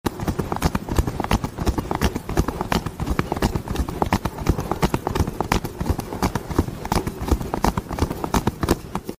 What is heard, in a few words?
indian massage; audio meme